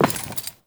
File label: Walk Metal, Footsteps; Enemy; Lady Deora